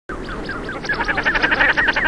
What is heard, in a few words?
shelduck, birdsong